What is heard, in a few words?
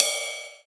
Ride Bow Short